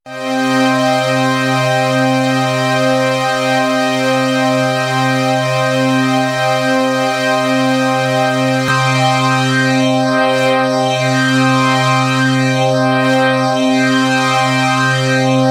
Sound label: synth variable, scanner vibrato